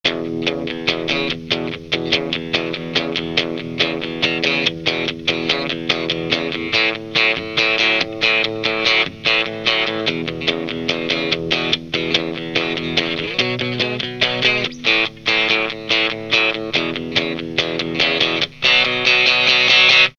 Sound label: Rocknroll, Guitar Effects Pedals; Pro Co